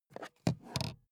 charger pick up

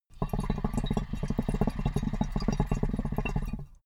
water pipe puff bubble long

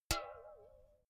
filled can hit ringing long

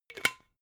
glass bottle massive close cap short

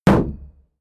shield sheet impact dent

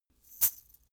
chain mail march single right

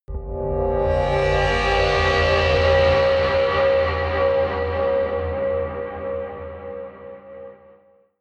ring flutter

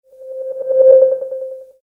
console control

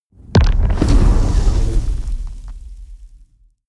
fire whoosh sci-fi large

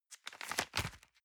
envelope swap hard

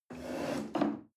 chair wood take slide medium

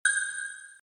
short ambient impact event

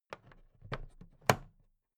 plastic clasp handle close

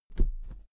pillow hit mattress

